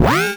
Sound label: Percussive FX; Vengeance Samples; VEH1 Percussion